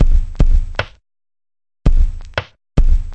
76 bpm disturbingly low kick snap

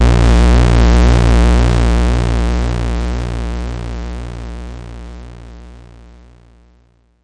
destroy you bass A sharp 1

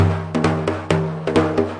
arabic perc, Arabic Percussion Kit

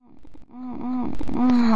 mmmmhh, female vocals; MISC and SPOKEN WORD

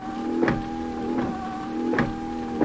winshldwiper; Sound FX Real Life

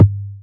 Syn Dr, Overloaded Synthetic Drums; style kits

